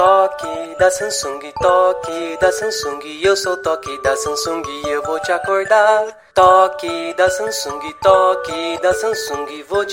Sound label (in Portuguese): toque da samsung